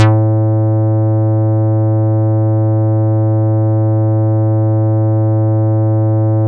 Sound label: Synths BASS